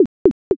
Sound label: slot stop